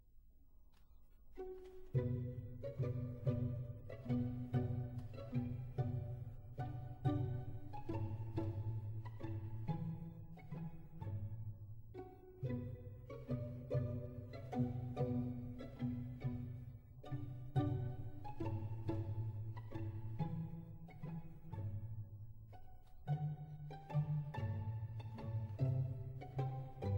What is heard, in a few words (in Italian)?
Romanza archi soli pizzicato seguita da una ripresa del Deutscher Gesang